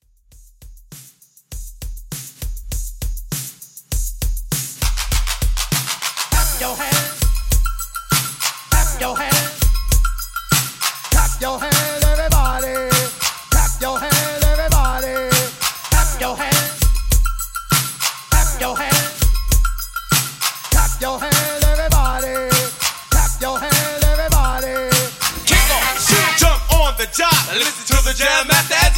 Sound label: Old HipHop Redrum 100Bpm